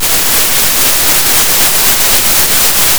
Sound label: Sound of a thunderstorm